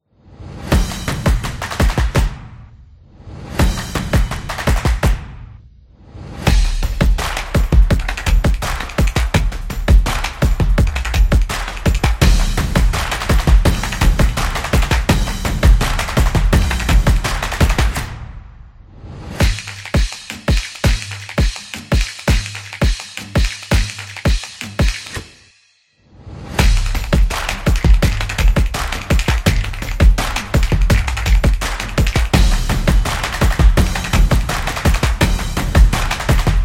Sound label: The Drums Percussion